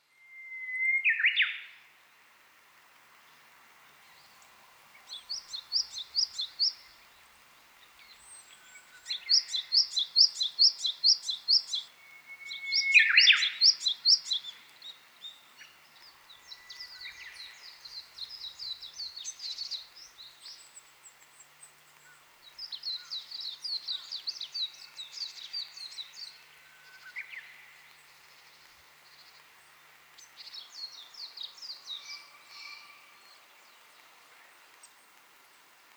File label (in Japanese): 茨城県守谷市の「野鳥の森鳥の道木道」での初夏のうぐいすやホオジロです。; うぐいす・ホオジロ2025年4月10日茨城県守谷市; 野鳥の声